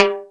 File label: Drumulator Timb Lo, EMU Drumulator drum machine; 300 Drum Machines